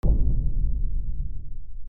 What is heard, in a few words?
Explode; kasmo